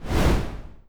flame thrower; Die for the Empire

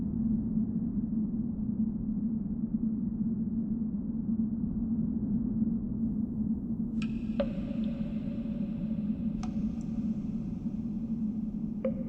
dungeon ambient

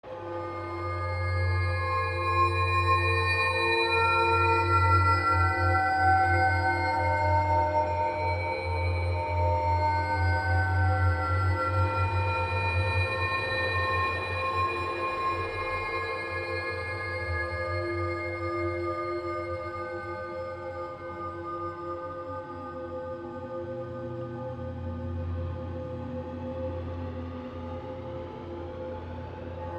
Dream (Ambience)

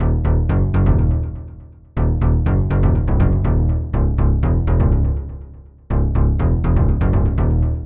SWINGING BASS

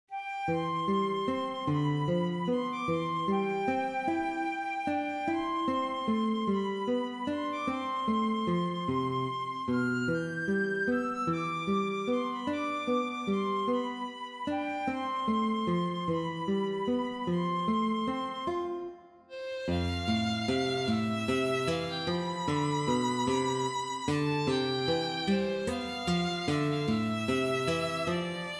Small Sleepy Town

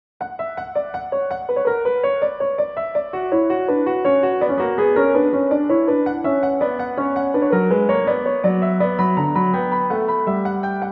2 Part Invention in B Minor

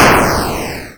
explosion

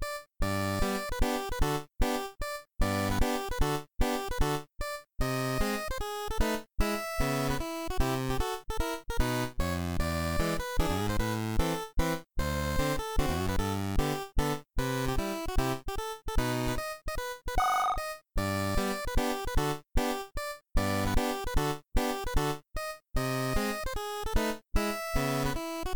funny track with ringtone effects c64 style

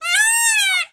peacock scream

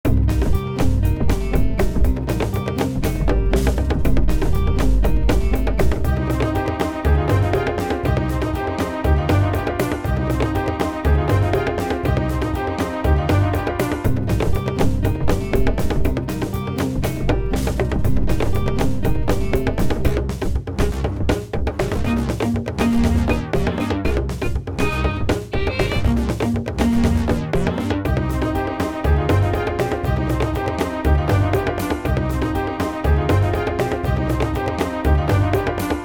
Jungle

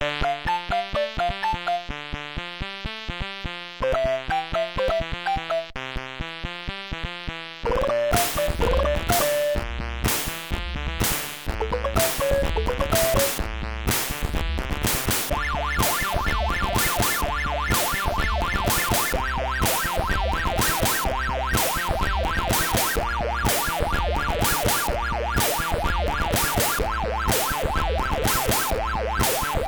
dance track with recorder and metallic effects

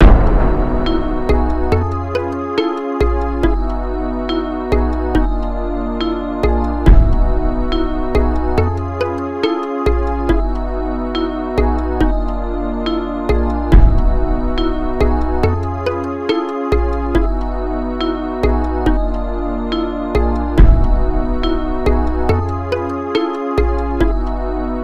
Quaint Town, LOOPABLE

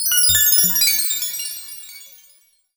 spell

